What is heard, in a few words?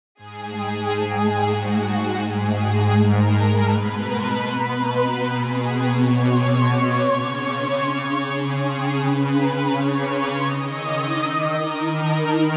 pad kappa velorum